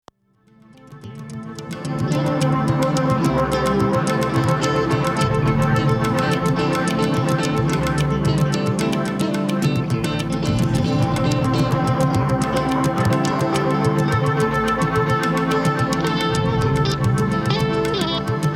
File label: tabla song; Galden